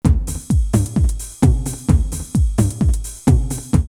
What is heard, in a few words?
909 Bouncy Toms 130, DrumMachines; drumloops; X-Static Goldmine